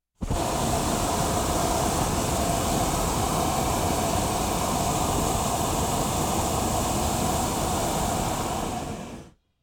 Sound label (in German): Sport Heissluftballon 1 langer Brennstoss